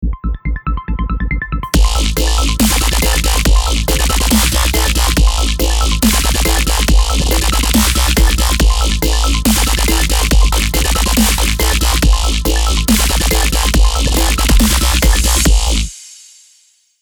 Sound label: trap powerful intro